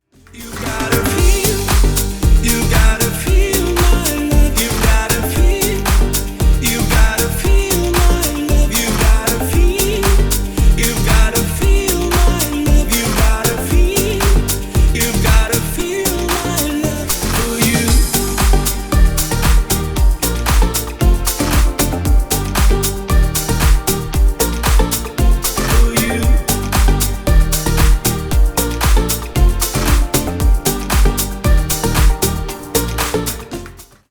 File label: violin remix